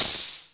open hat sound g sharp key, Royality free open high-hat sound tuned to the G# note. Loudest frequency: 6132; open hat samples